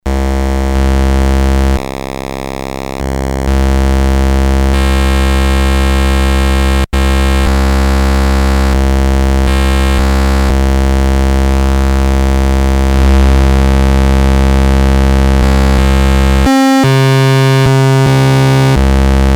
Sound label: test waveform; Solton SM100